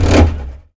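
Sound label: gear down; CRC2005; CRC 2005 Demo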